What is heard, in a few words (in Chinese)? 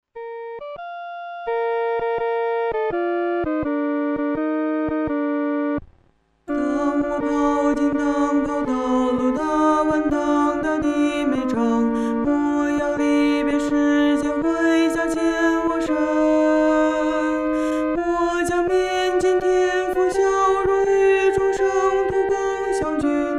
独唱（第二声）